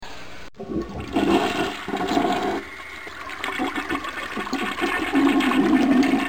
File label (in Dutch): toilet doorspoelen